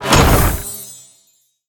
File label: Lock it Open; narcos